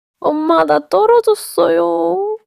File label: crying